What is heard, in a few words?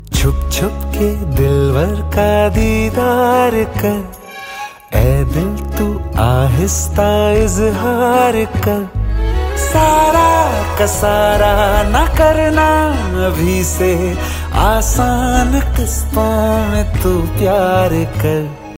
song ringtone, bollywood; roohi movie